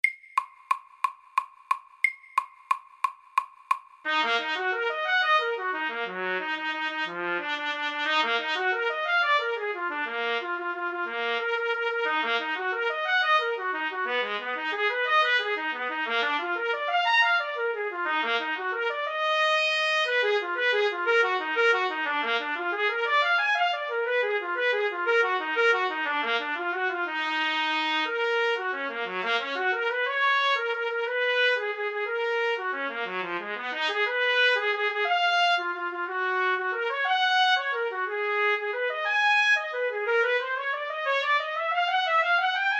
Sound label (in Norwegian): Etyde i F moll FORT